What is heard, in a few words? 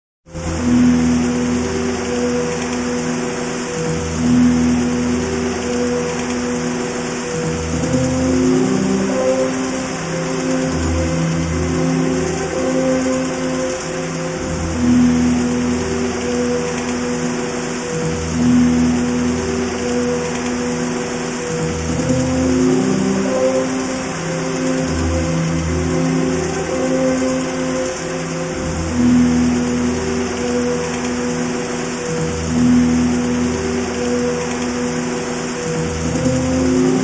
Rain & Waterfall